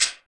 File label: RSS PERC